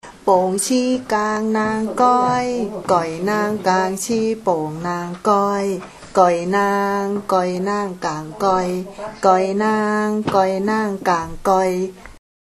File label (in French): Comptine thailandaise